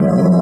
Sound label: fart short